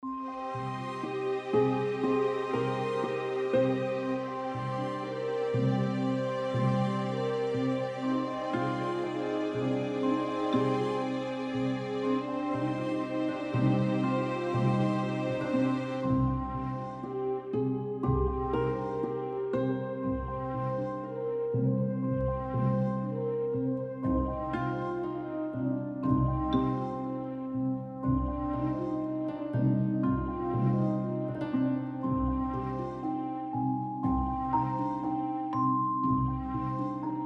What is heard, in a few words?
Music Loop Cimbalom 32 Bars; Agent A A Puzzle in Disguise; Windows